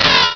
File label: cry; source of the sovereign